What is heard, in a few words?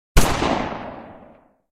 Gun; Valter; One Eleven Android